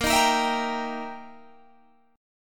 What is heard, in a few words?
E Minor Major 7th Flat 5th, Bb